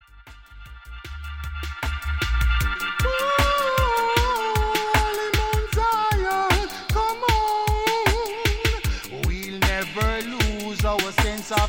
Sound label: Mount Zion (Drum & Bass)